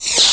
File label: shrink; Bovine